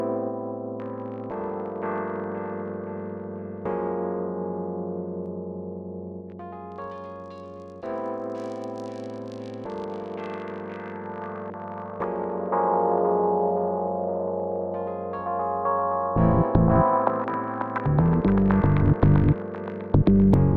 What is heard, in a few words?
rainy music